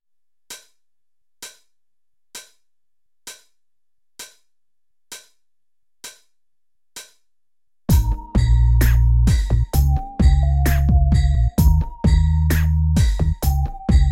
hip hop straight

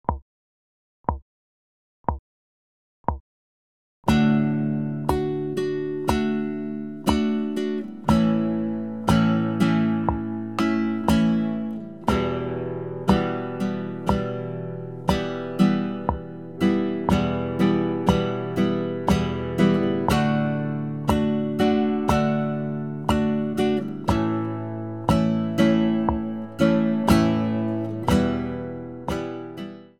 strumming

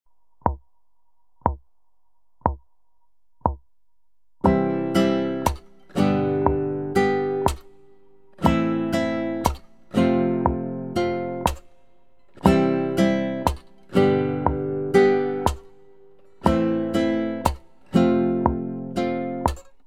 strumming; strumming nr 9

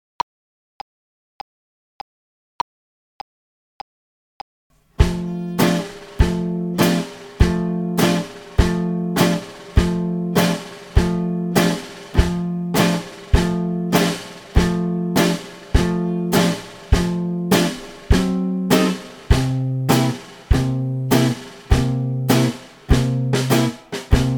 two beat slow

